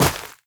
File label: step run, footsteps player grass